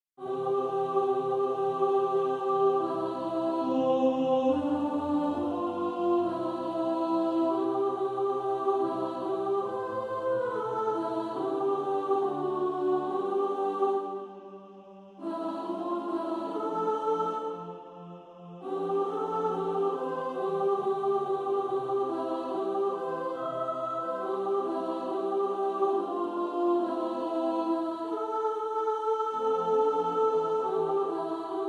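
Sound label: soprano, practice music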